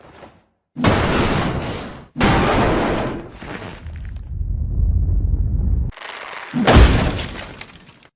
breakdown hits

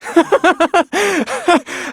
laugh pain; kiyotaka

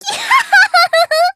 kyahaha

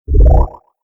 speed-up

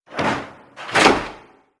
dead lever

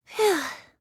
sigh; kaede

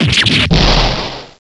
fan; octavia

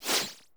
item choose; persona 5

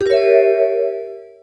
surprise

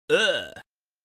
ugh

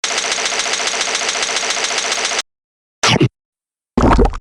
break

